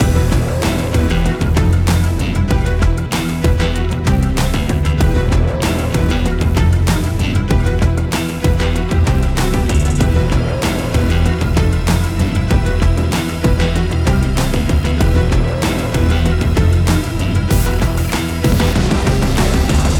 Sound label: Library Fantasy; Audio Animals